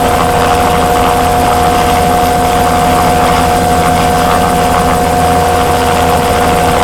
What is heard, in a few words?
vehicle littlebird idle; veh littlebird idle player